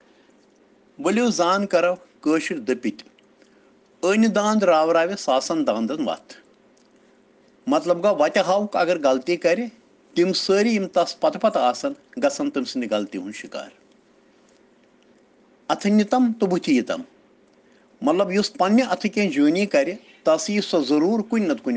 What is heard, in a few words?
Children Rhyms in Kashmiri